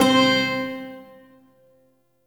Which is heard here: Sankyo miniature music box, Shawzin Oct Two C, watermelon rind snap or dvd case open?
Shawzin Oct Two C